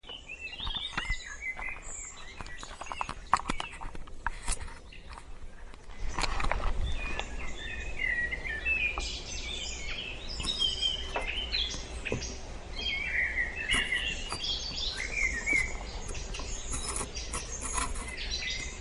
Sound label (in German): Vogelgesang Waldparkplatz; Bilderlahe